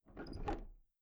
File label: Door Knob; Welcome to the Game II